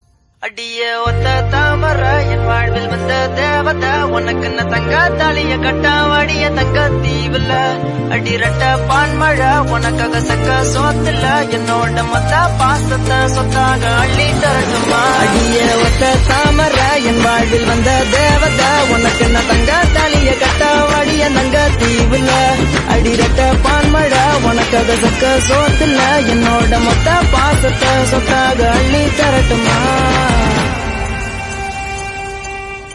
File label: Tamil Song Ringtone